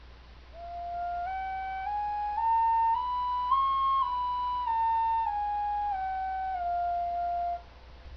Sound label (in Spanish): escalera